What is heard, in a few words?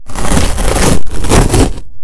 paper; drones; garrysmod